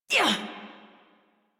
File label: Exert, Adult; Melee Swing; en; Warframe; New War; Adult Operator